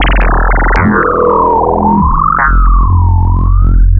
Noise Dizzy; Ableton Live 12 Lite; Vector; Sprites

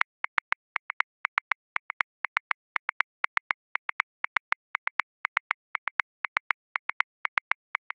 Bomba Accent 16ths, Grooves; Ableton Live 12 Lite; Core Library

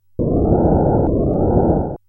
wind; mmx sfx

